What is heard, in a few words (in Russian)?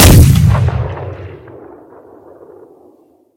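дальний выстрел из гаубицы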